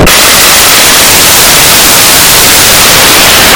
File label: train earrape